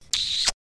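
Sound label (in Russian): звук обычного поцелуя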